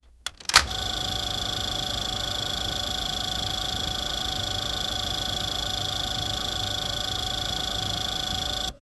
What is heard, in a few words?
old film projector turnin; vintage